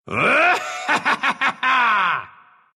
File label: laugh, ru; Dota 2; Omni